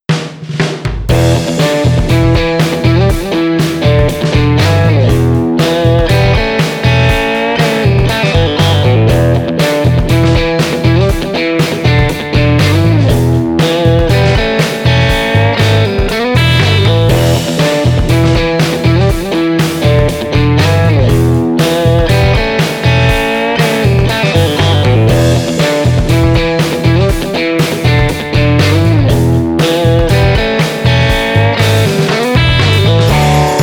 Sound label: Blues Rock 120